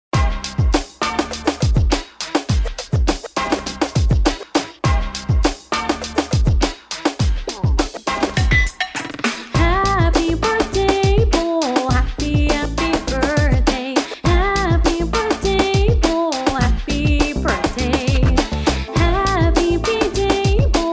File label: Bowl